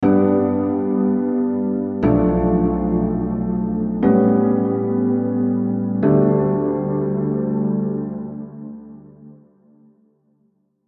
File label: Lydian Dominant Prog; Unison Lydian Dominant Advanced Scales MIDI Collection